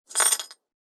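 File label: sound of trembling ceramic piggy bank; zvuk drozhashej keramicheskoj kopilki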